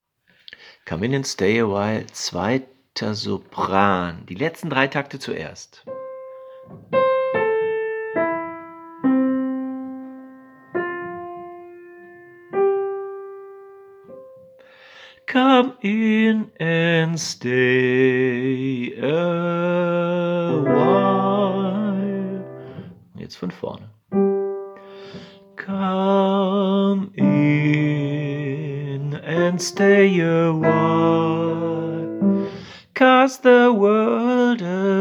Come in Sopran

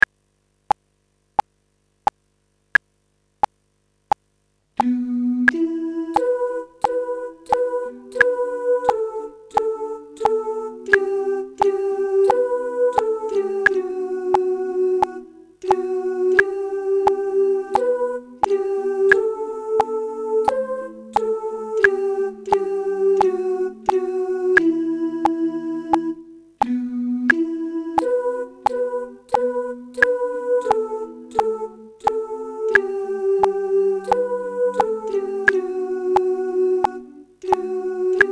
O Tannenbaum Sopran

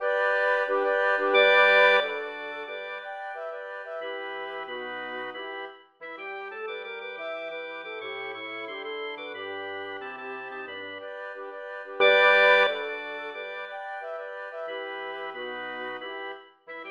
Chorklang; You better mind

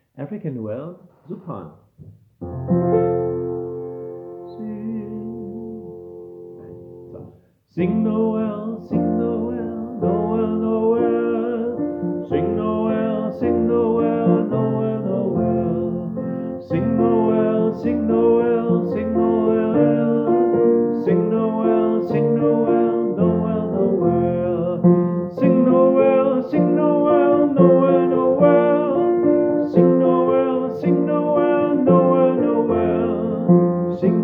African Noel Sopran

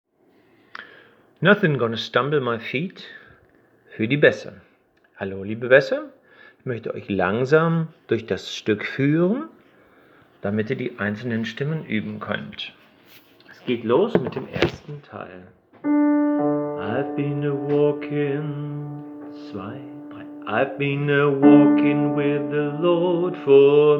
Bass

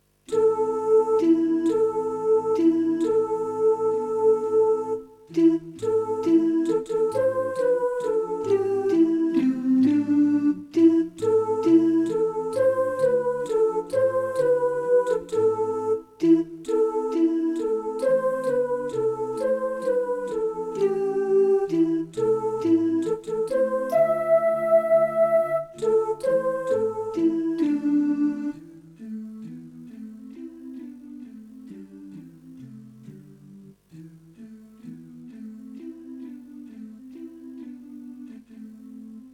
Sopran

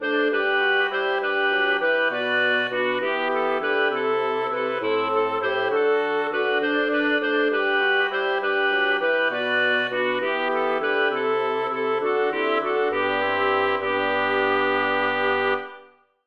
Chorklang; On Christmas Day

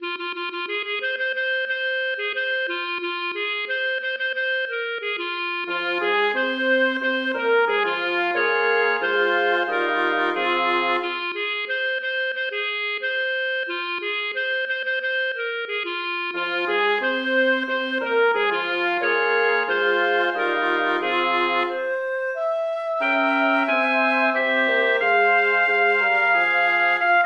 Hold on Chorklang

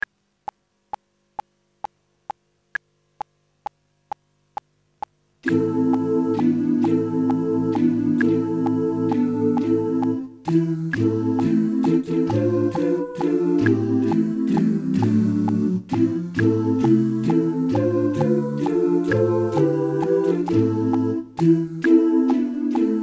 Chor

